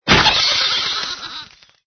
black pumpking explodes; Angry Birds Seasons

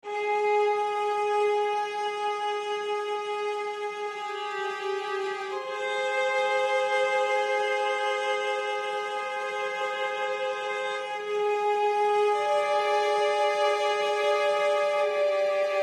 Loop Emotional

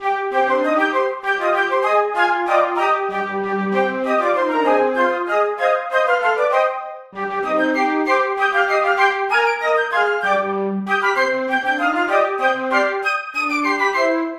Flute; The Bard's Tale Remastered Trilogy

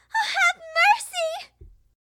Gameover; Hilzartov; Fairy Size Doesn't Matter

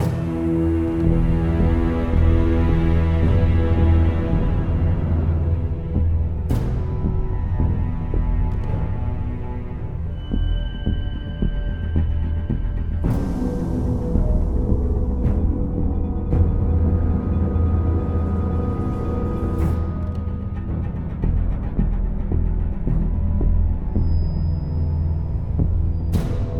loop Stealth; the walking dead michonne 1 in too deep

